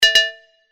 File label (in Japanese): ココン 木琴 音高め